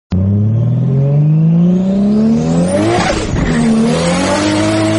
supra mk4 sound supra car sound effect

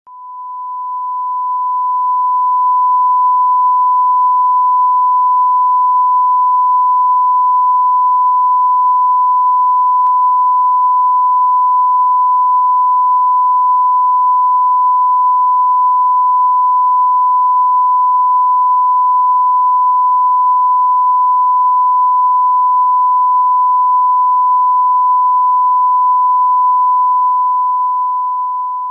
static tv signal

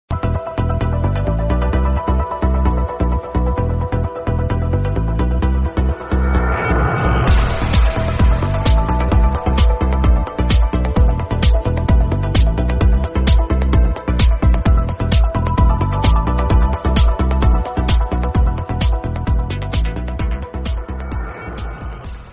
tecno mobile spark original theme

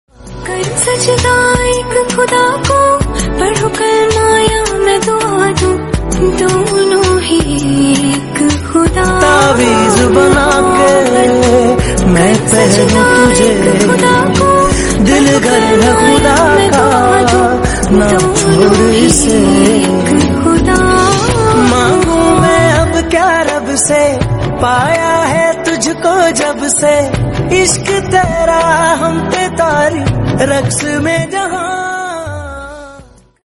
female version

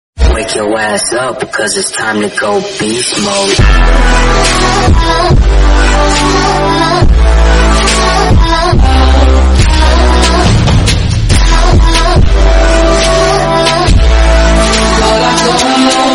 bgm remix english

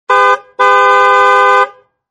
audi car honking

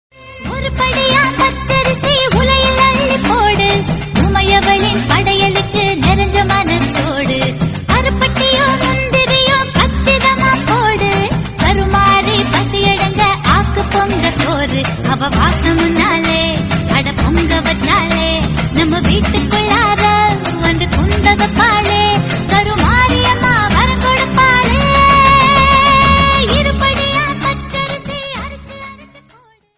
tamil devotional

